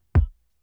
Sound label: KICK EASY